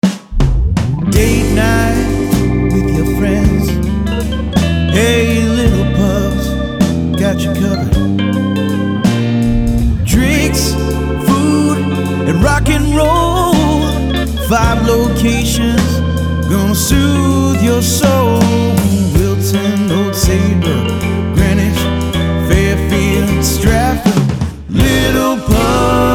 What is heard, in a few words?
Jingle Slow Blues